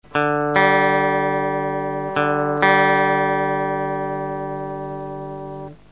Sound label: ear tune